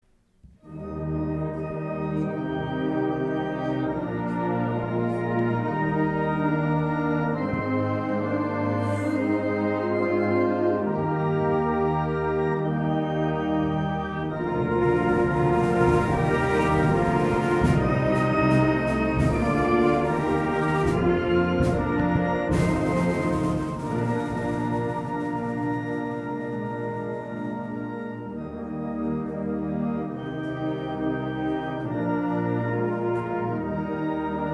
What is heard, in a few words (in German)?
choral; montreux